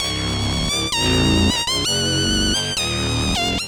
Synths, Underground UK House & Electro; Sonic Foundry (Sony Creative Software)

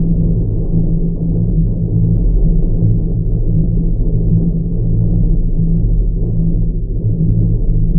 Drone Ambient; Drums & Drones

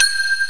BRIGHT BELLY, D50; D50 STORY; East West; Global Sounds

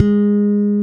SLAP G#4, Bass; Spectrasonics - Bass Legends CD 1; ALL THUMBS

